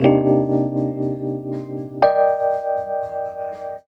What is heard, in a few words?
HEY, Roots Disco; USB Soundscan vol.32; AKAI